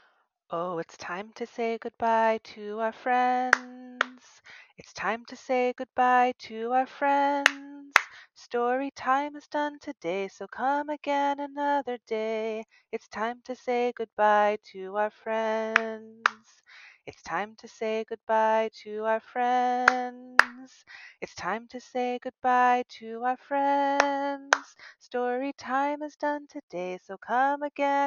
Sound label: oh with claps